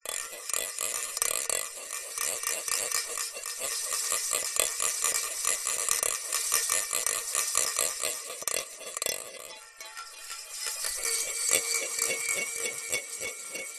industrial ball bearing metal small spin in metal pot